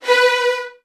VN 16TH, 3B2; ILIO; Kirk Hunter

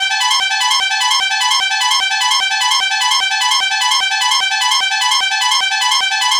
Trombone Effect, effected trombone; Sonic Foundry (Sony Creative Software); Jazz Solos and Sections

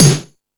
All Snares, E-MU Pro-Cussion; drum machine

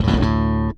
Slap DA, Bass; Construction Kit; One Shots; Bass X

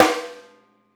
Pork Pie Snare; Essential Percussion

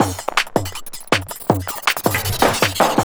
JUNGL 160, JUNGLE LOOP

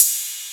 Cymbal, drum machine

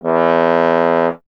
trombone; Miroslav Vitous; Solo Instruments VOL-1

